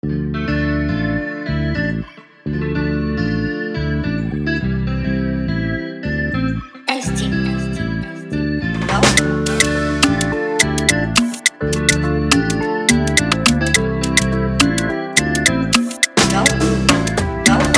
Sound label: FREEBEAT